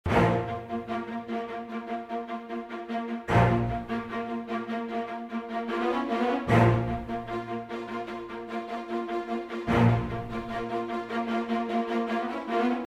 ostinato strings, violas and second violins again with bass/celli stabs; epic orchestral track